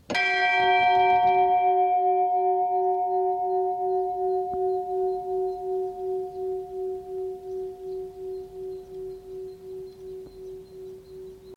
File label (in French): son cloche